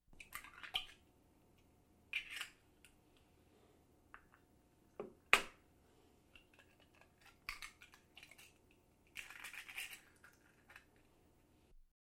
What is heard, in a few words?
Bottle opening sequence